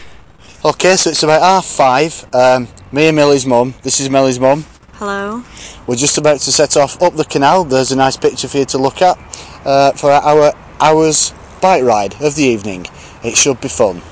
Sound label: evening cycle ride